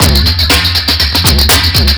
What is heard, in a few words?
BONG splang